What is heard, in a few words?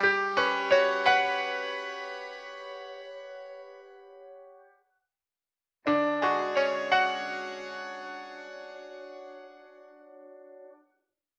piano guitar, sad loop; loops